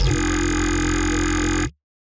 cyclotron constant

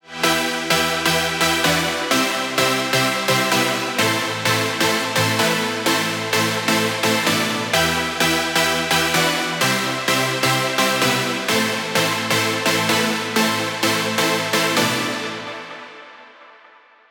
chords pack

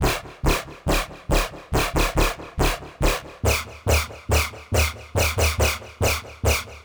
bass

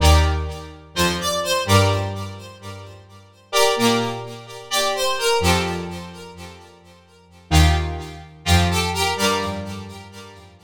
riff backwards sunrise; cosmic

